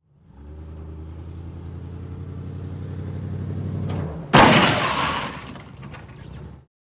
Drop Impact Moving Vehicle Collides With Stationary Vehicle, R To L, Scraping Metal And Tire Squeak